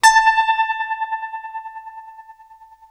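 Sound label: tremolo A 4, guitar; East West; Steve Stevens Guitar Samples Collection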